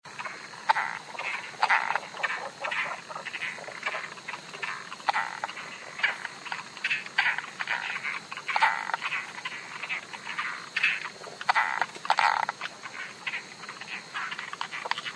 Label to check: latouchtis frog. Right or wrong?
Right